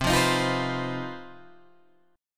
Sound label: C Minor Major 7th Double Flat 5th C down Guitar Open G